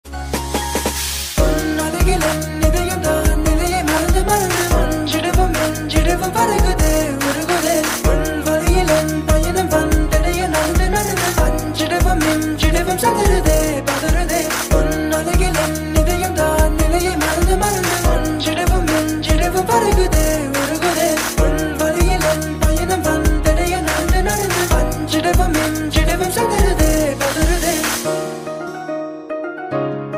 bgm ringtone sad ringtone

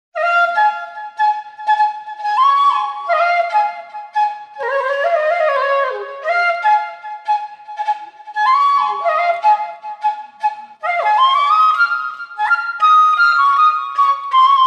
flute bgm flute malayalam

